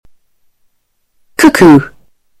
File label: cuckoo